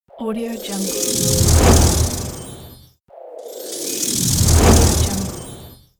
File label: magical sparkle whoosh